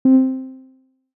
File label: mono Synth